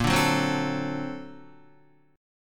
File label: Bb Augmented Major 9th Bb, Guitar; Bb+M9 strummed; JGuitar